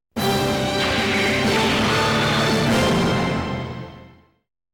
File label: Commercial Bumper Sting